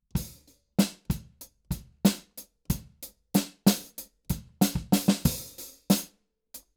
KIT

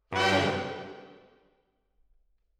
MKH AB, piano

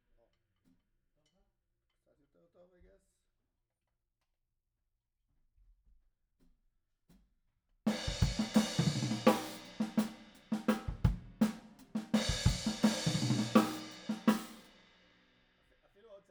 Room

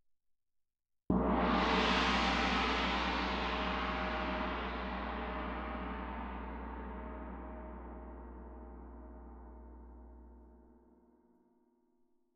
Tam-Tam Close

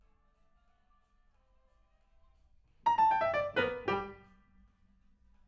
PIANO, RNR for piano

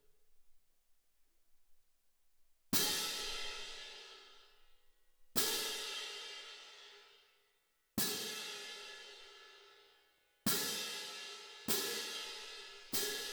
Cymb Main

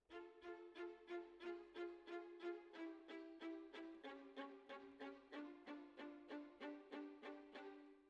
Violas, jazz for piano

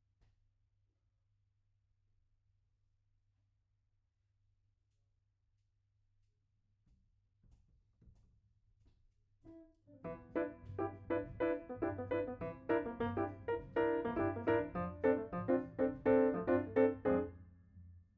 Piano B

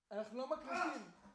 TB GTR

